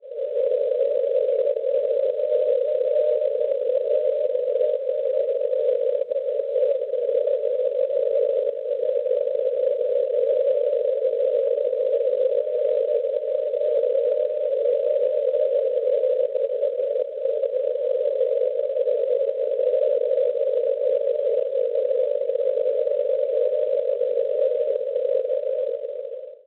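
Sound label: non-directional beacon 426 kHz; CTS; Castets; Francia; Schwarzsee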